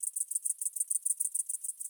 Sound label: Grasshopper-Chirp